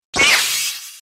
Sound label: Saber Hit